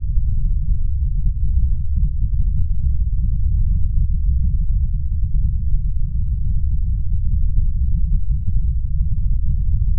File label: Rumble Loop